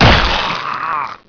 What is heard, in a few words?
click